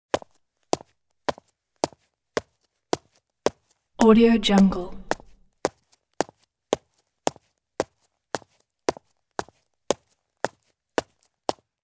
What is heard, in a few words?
male walking on concrete stairs; sound-ideas